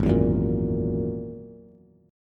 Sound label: Ab 7th Suspended 2nd Sharp 5th, Bass, Bass Standard; Ab7sus2#5 strummed; JGuitar